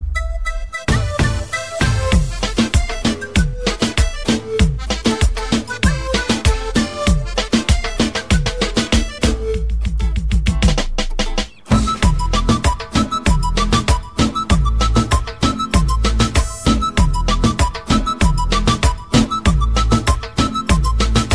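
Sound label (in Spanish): noche instrumental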